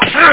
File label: Head Butt; eldritch